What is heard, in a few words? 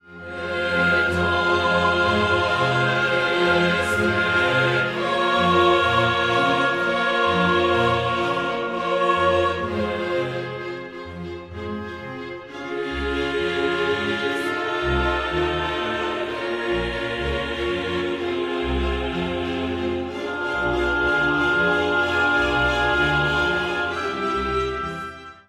Gloria Qui tollis